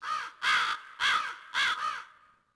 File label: crow; Ambient; MX vs ATV Unleashed